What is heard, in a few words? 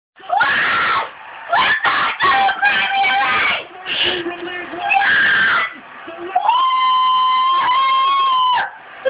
final whistle happy 8 year old Norwich fan